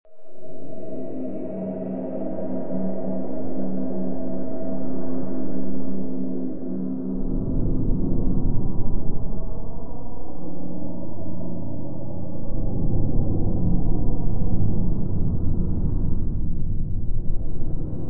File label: ambient; enceladus